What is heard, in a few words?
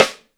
RIMSHOT, Ragga & Dub Reggae